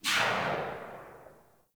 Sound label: Fire Tail, Weapons; Warframe; Burston